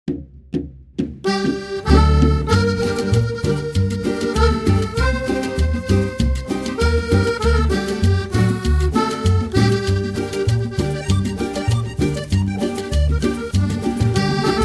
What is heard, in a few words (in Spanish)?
La Cumbia